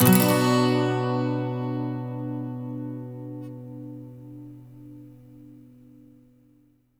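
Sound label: 12 string minor B, Electric & Acoustic Guitar Loops; USB Soundscan vol.04; AKAI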